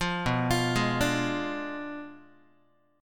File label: B Diminished 7th B down Guitar; Bdim7 arpeggiated